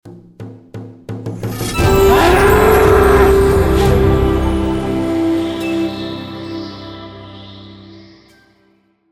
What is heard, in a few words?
bonus Prize you Won Reveal bison; Shamans Dream 2